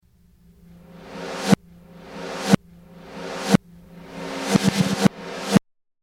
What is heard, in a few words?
Reverse snare